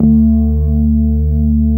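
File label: CX3 organ; USB Soundscan vol.09 - Keyboards Old School; AKAI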